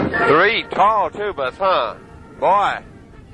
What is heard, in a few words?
tall tubas